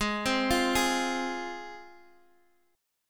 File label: G sharp 6th